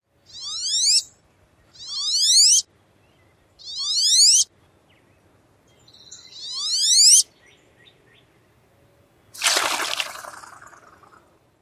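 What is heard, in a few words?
male squeals, Wood Duck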